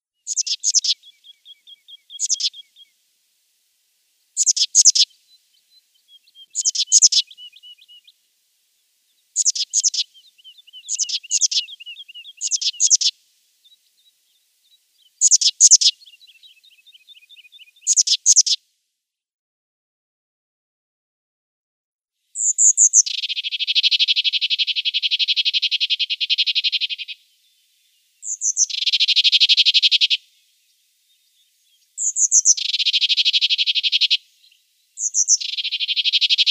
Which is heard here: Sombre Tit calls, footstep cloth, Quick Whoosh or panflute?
Sombre Tit calls